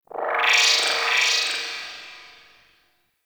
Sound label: Corpus Ship Data Chirps, Ambience; Warframe; Corpus Ship Remaster